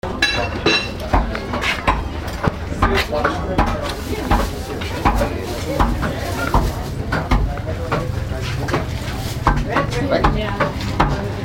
coups de feuille sur billot du boucher

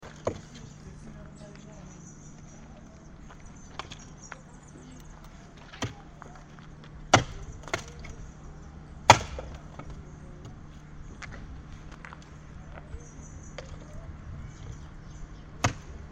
hache voix

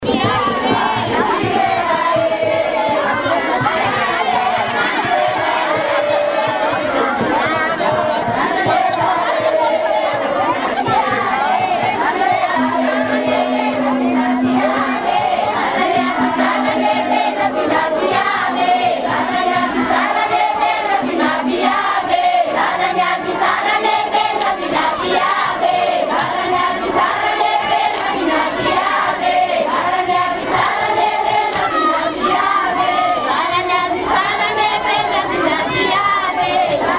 chant

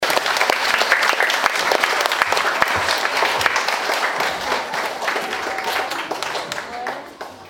applaudissements concert; concert dessiné Jean Piso

